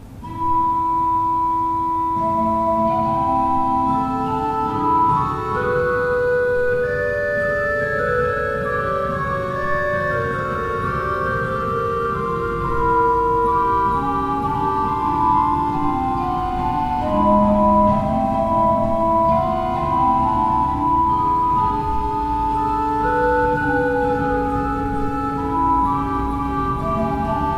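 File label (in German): Seifert Koeln, pfeifenorgeln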